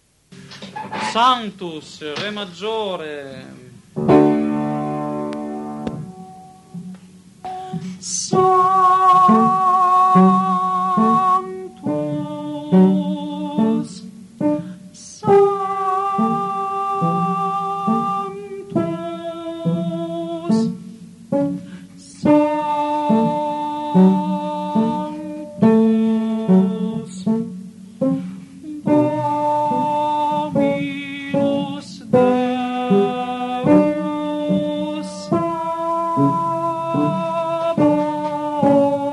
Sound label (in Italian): Sanctus tenori; Mozart